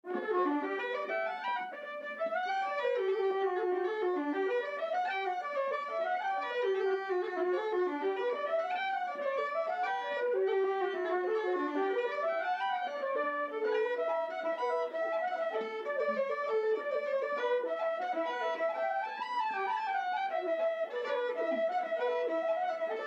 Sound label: slide; Colemans cross